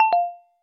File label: cam stop; LG Swift L9